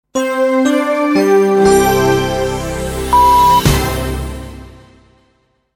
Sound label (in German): SoundLogo 2 Toene; Soundlogos für Ihr Corporate Design